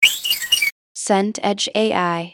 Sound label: guitar slide string